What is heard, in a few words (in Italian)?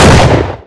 sparo, armi